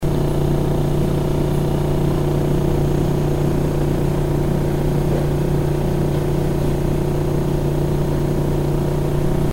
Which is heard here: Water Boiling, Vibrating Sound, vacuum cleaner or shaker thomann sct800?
Vibrating Sound